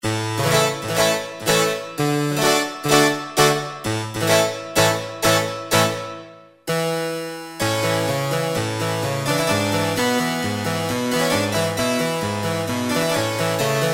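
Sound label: harpsichord, Yamaha TG33; Factory Presets; KY-Hrpsi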